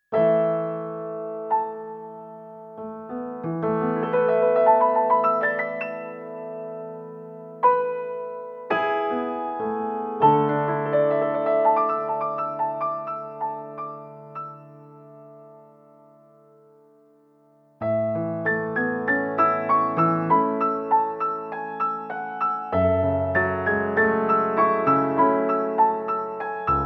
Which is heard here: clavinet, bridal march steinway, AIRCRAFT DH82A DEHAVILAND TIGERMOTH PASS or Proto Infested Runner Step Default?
bridal march steinway